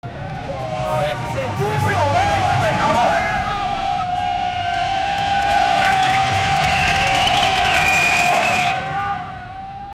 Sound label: ambience chaos the roar, motorsports